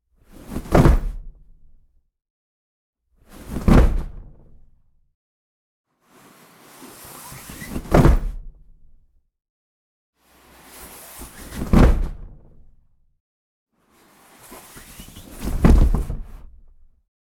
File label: Body Fall Wooden Floor Vinil Floor Covering